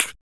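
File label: vox percussion, Vocal Planet Jazz FX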